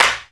Clap Big Beat, E-MU Proteus-2000

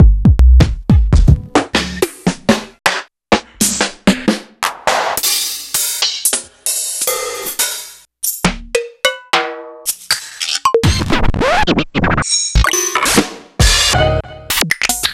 Speed Garage; proteusvx

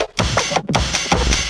LOOP SD, Drum & Bass, 160 BPM; Ueberschall; Drum & Bass Flash Trackz

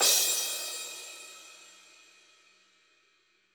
CYM; Bob Clearmountain Drums I

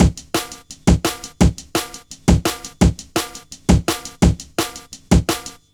LOOP, Jungle

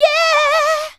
Yeah Breath; Mo' Phatt; Digital Sound Factory Mo' Phatt

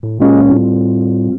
Rhodes, Keyboards; Total Drum&Bass

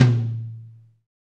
TOM TOM, Real

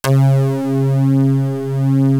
JD synth

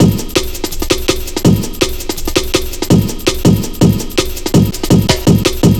165, Drum Loops, Drum & Bass

